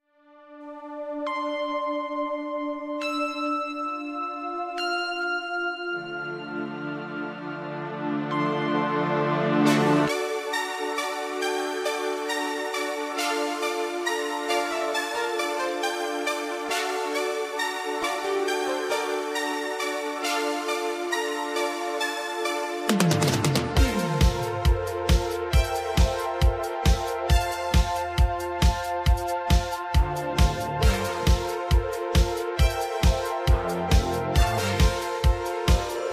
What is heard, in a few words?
Synthwave